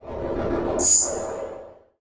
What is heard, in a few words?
Transition Growls Vocal; Production Element